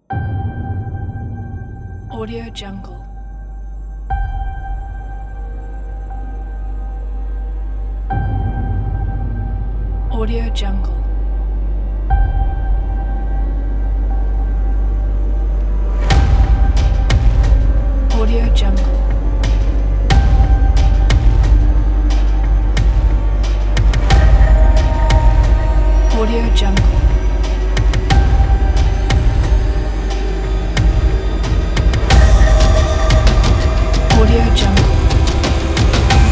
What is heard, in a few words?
horror intro